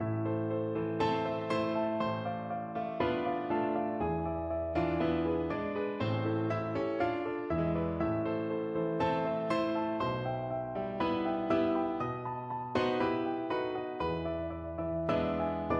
ayes del alma, piano

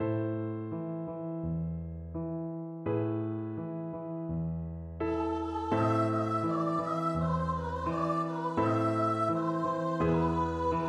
VOICE, unison choir

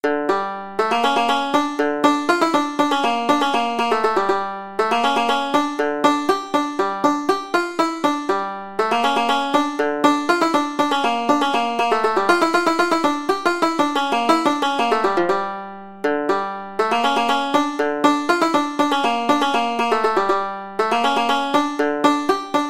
banjo

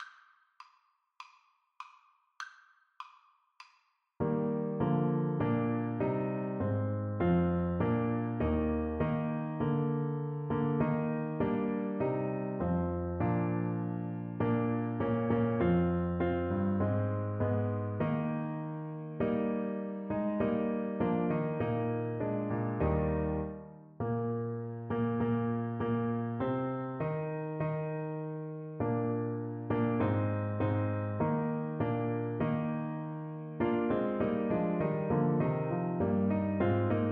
trumpet

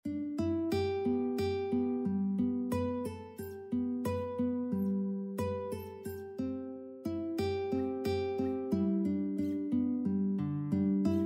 can that circle guitar

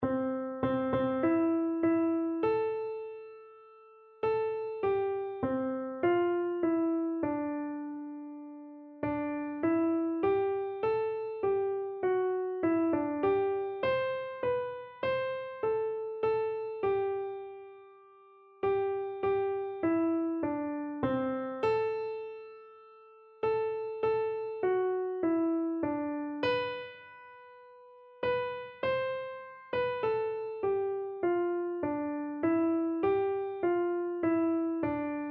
crown him, keyboard

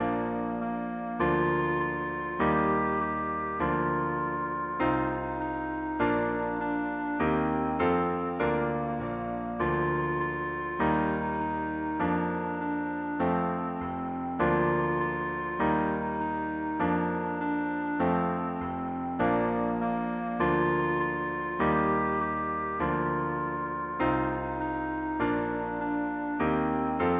twinkle twinkle, clarinet

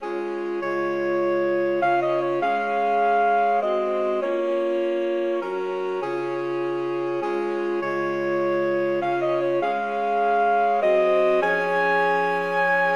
saxophone quartet

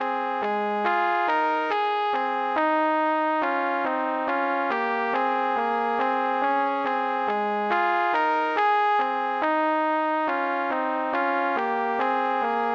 goodking, trumpet duet

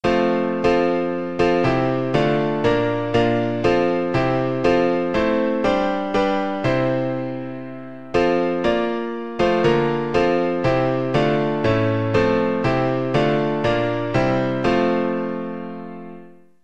While Shepherds, piano

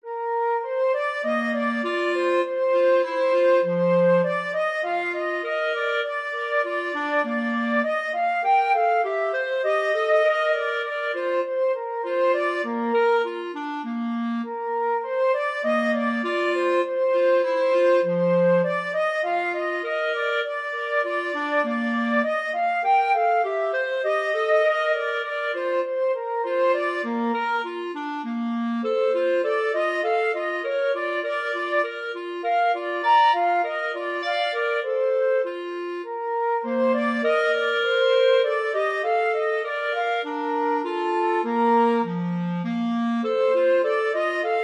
flute clarinet duet

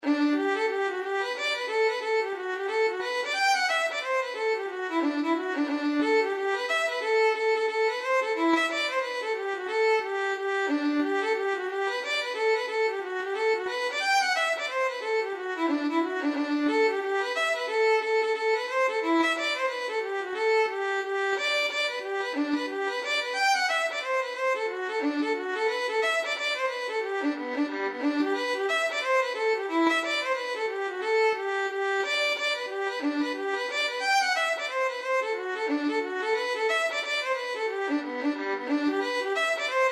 stranger limerick violin

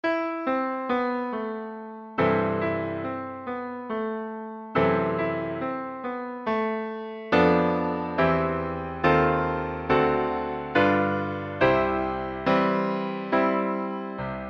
tchaikovsky piano

